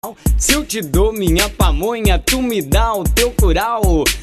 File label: funk